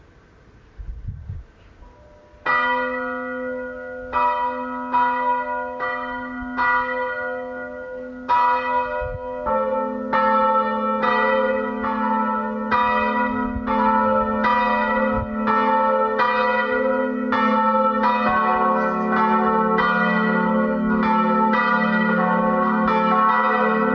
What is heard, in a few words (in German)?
Glocken, Wallfahrtskirche in Biberbach bei Augsburg in Bayerisch Schwaben